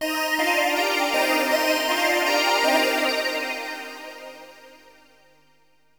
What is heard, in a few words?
synth pad ambient 160 C; Sphere; eJay